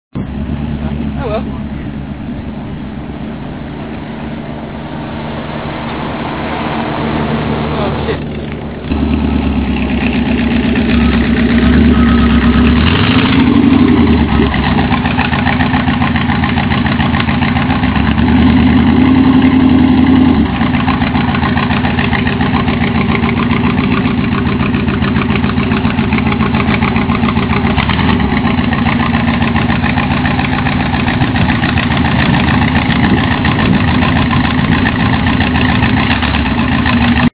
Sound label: test, v8 micra; magicspanner